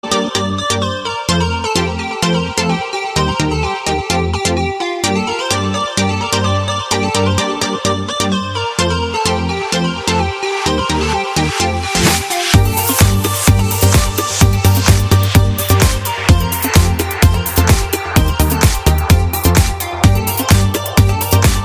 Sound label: accent ringtone